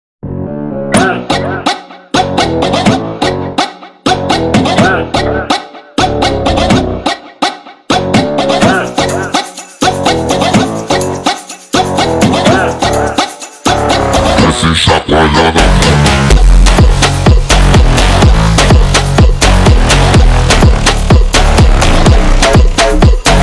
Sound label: brazilian phonk